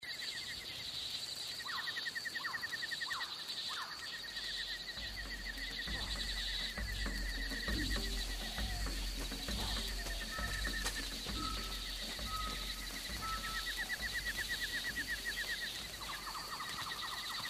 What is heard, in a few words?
Island Village Environment; U.B. Funkeys